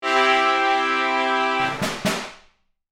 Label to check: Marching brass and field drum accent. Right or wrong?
Right